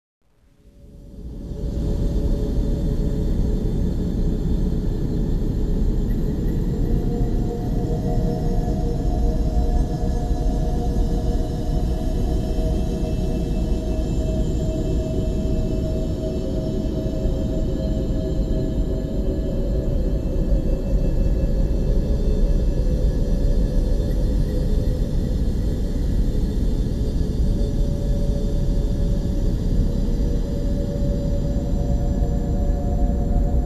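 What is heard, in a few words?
outer space sounds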